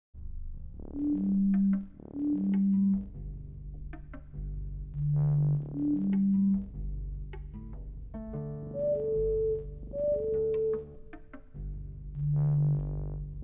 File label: african jungle